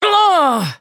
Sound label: battle pain; dqxi; eleven